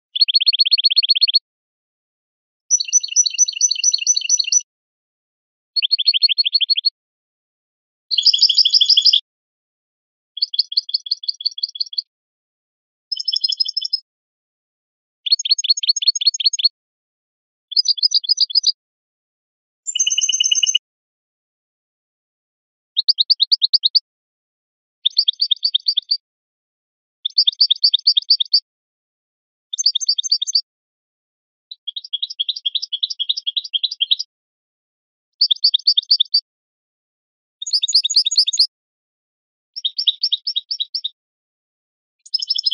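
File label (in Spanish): repertorio campanilleo, canario; campero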